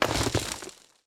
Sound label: dirt break